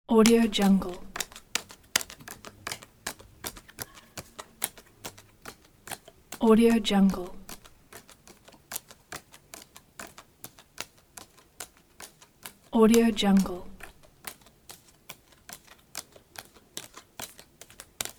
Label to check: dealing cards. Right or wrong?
Right